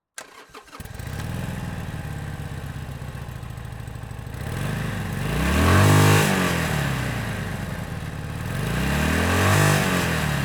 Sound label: HD Sportster Iron 883, Start, Accelerate on the spot, shut down, Beside vehicle; Harley Davidson; Borg Sound